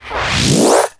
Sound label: VFX Short Fills & Reverse